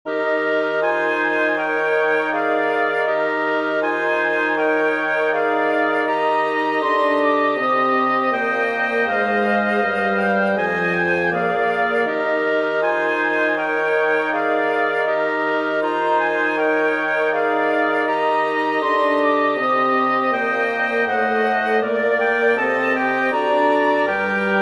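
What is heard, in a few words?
prélude for woodwind quintet; dubois theodore from small pieces for organ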